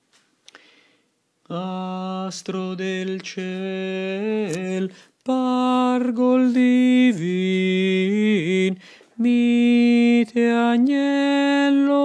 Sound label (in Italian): astro del ciel tenori